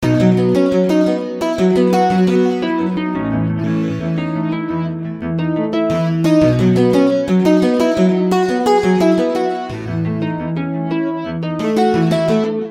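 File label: Layered guitar, V-Synth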